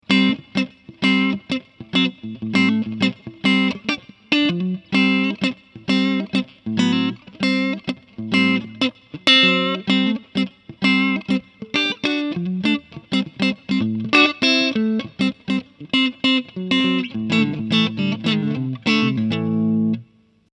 50 wet single note

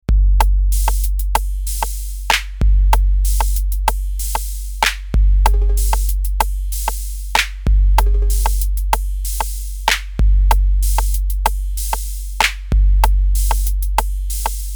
Roland TR 08 tuned

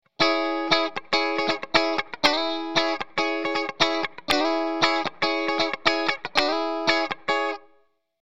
Funky Bridge Middle Coil Tap

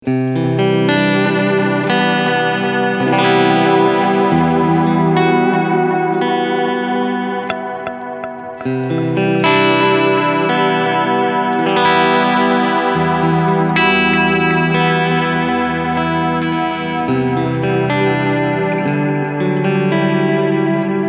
Max Delay Mod ON

